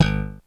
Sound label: chop; xr10